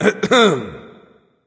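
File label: non-verbal clear throat; smite; voicepack; houyi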